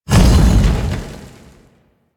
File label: Roll; Warframe; Qorvex